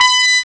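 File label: trombone; CMI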